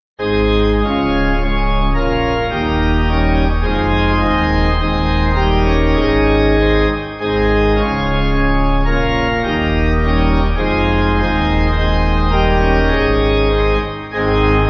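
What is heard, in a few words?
Pipe, Pipe Organ backing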